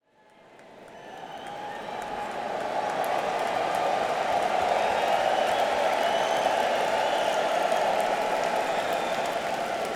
Crowd; Manchester, NH - SNHU Arena

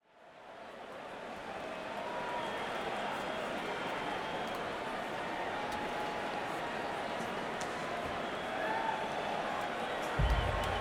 Crowd, Madison Square Garden; New York, NY

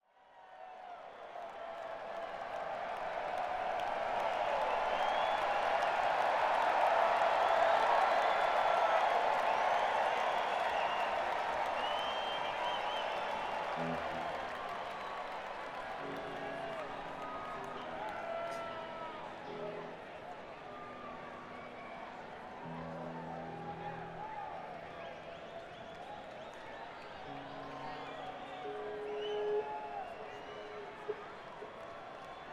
Crowd; Las Vegas, NV - MGM Grand Garden Arena

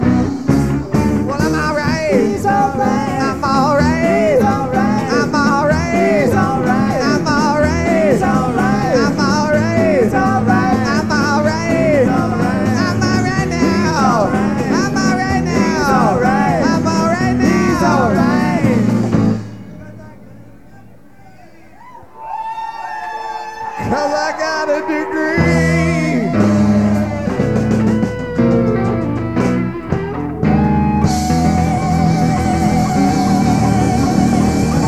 Burlington, VT; Nectars

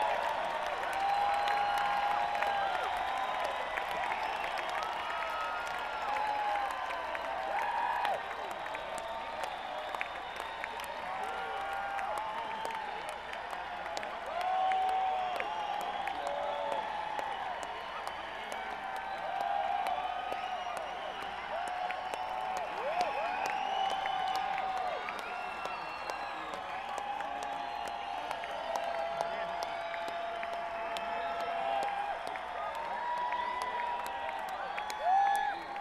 Crowd; Sacramento, CA; Cal Expo Amphitheatre

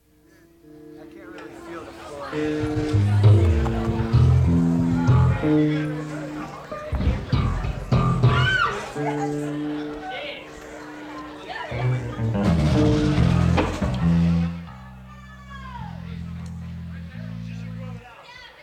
Crowd; Atlanta, GA; Cotton Club

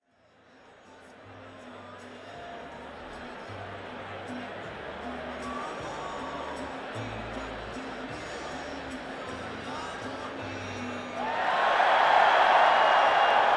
Crowd, Madison Square Garden; New York, NY

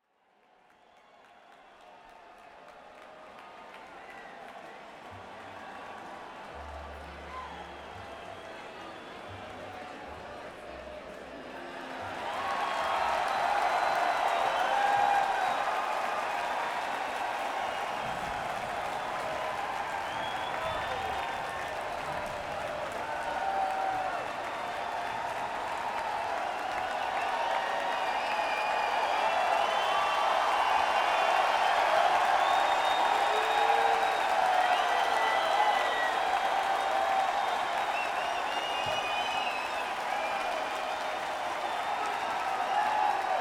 Crowd, Saratoga Performing Arts Center

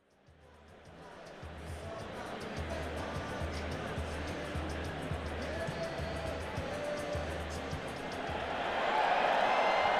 Crowd, Madison Square Garden; New York, NY

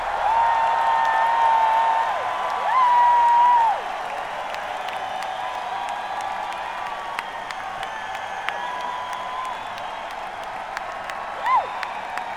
Crowd; Philadelphia, PA; Corestates Spectrum

